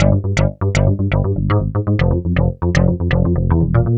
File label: Tech Bass, Synth Bass Loops; Sonic Foundry (Sony Creative Software); Groove Elements